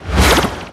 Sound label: hole rise up; littlehorn